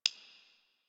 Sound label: Messenger Hover; Ambience; Warframe; Nineteen Ninety Nine